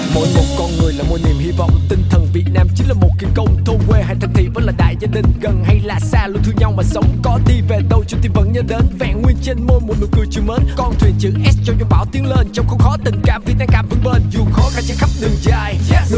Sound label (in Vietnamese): Nhac Chuong Rap; nhac tre